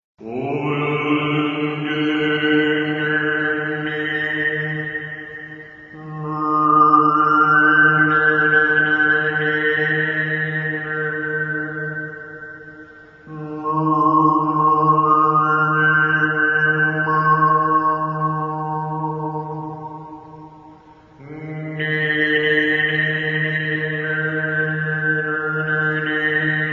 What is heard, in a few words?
vocals